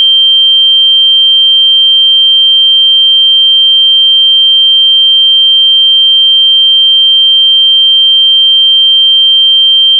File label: 3150 Sine